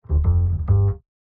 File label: Bass, Jazz for piano